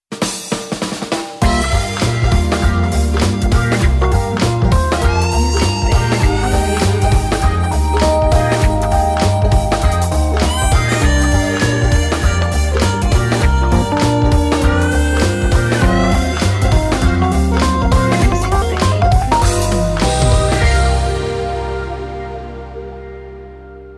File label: Metro Logo